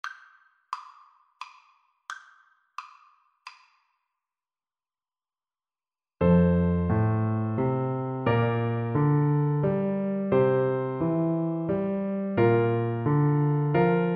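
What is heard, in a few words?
recorder karaoke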